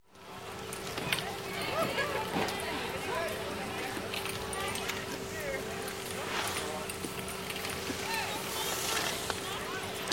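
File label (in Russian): zvuki snouborda, snowboard sounds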